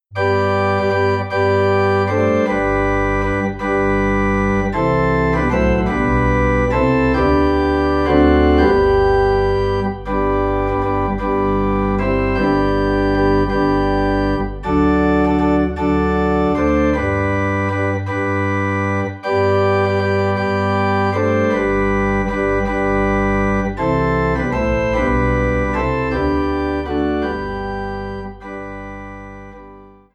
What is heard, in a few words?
Organ in G; More About Jesus